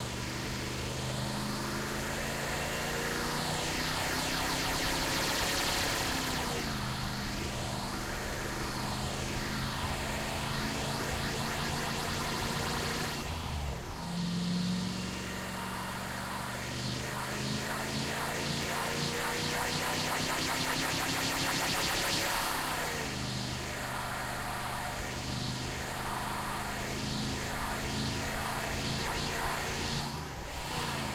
time travel car loop; teardown ps5 windows xbox series xs gamerip 2022

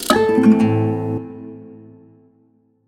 Menu start; WrestleQuest

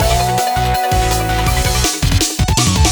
Transition; sky rogue

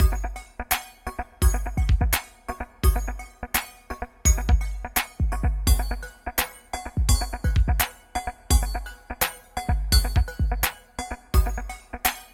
127bpm

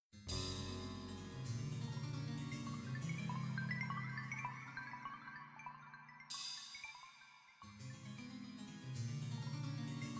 Shop Music; Gods Remastered

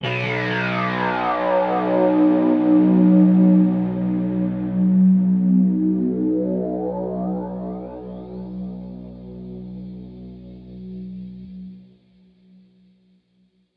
Overlay Drone

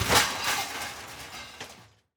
metal break; teardown; ps5 windows xbox series xs

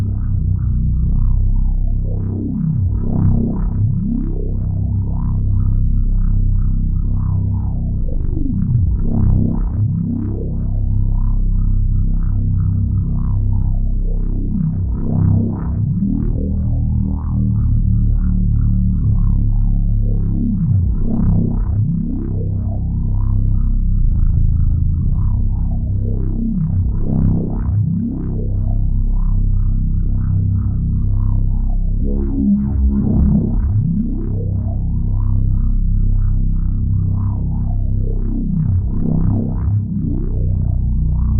Main Menu Synth; Pawarumi

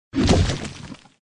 Sfx Enemy Golem Attack; Mighty Knight 2

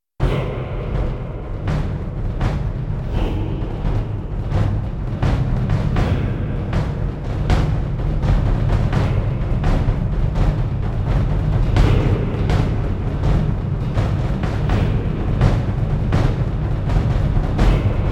victory beast; Armies of Exigo

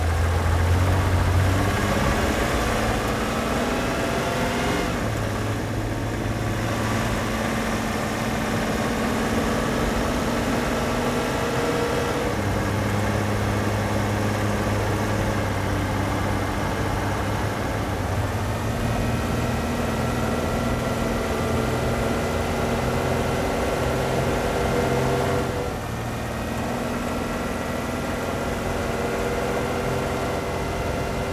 vehicle van drive

